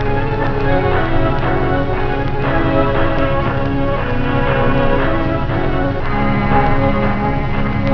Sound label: clear plastic box; Blister pack; Blister Pack Plastic: This is the soft plastic found in blister packaging.; SoundScriber Record Embosser